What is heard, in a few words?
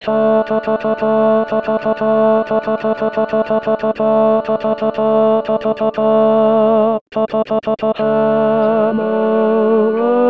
Tenor